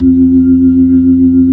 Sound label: B-3, Jazz; Roland LCDP10 Keys of the 60s and 70s 2; Jazz Ch 16